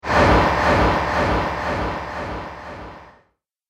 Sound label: Sturdy Sweep Delay, whoosh